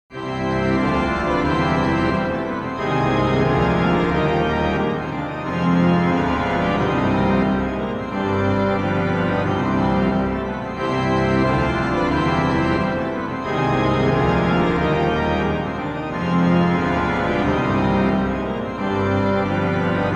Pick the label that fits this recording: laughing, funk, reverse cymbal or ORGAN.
ORGAN